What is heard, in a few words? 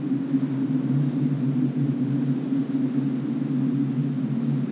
wind tunnel; ambient